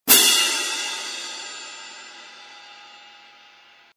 20 HH Germanic; sabian

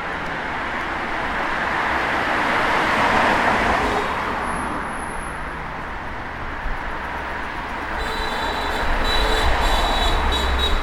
noise

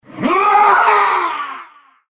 Death; Zealot

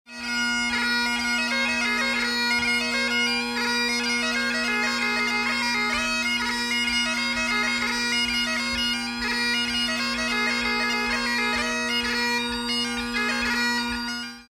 Jig, Bagpipe; Brittany's Jig

